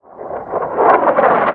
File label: explosion artillery far